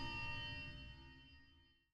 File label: sonar Tail Water Medium Beacon Directional; Barotrauma; Real Sonar